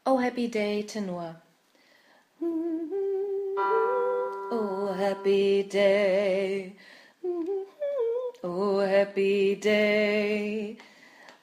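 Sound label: Oh happy day Tenor, gospel choir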